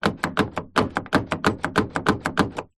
transport open car door attempt but locked multiple fast